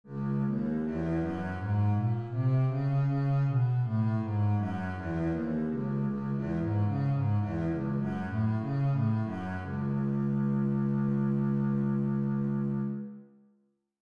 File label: bass c sharp minor, scales